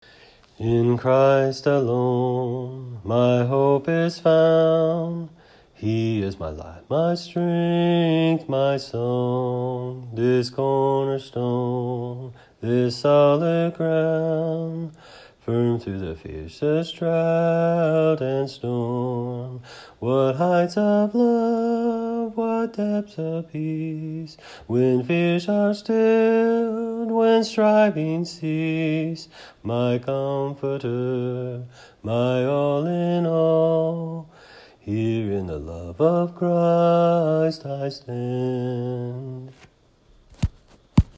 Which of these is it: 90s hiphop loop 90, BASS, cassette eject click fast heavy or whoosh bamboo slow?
BASS